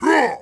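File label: Attack Vox; Sid Meier's Civilization 4 Complete; Warlords; Units; Omen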